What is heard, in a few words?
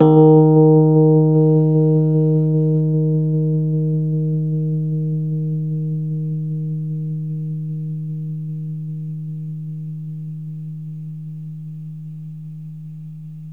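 Rhodes, D#2, right; 3D Sound; 3D Keys VOL-1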